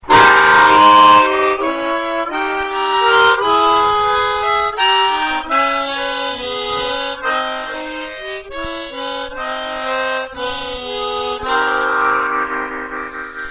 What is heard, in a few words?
Counterpoint